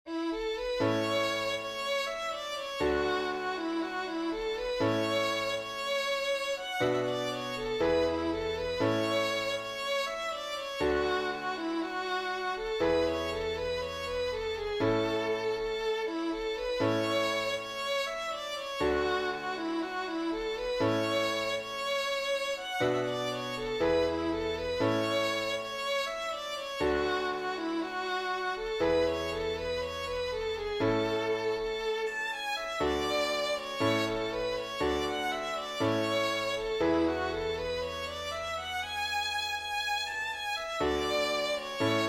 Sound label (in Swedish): midsommerschottis